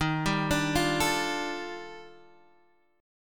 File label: Eb Major Sharp 11th, Guitar DADGAD